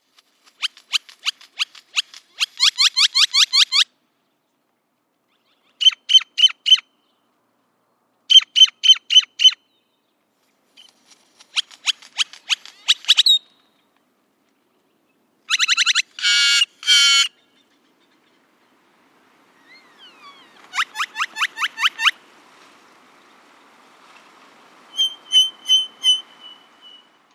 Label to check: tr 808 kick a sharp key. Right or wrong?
Wrong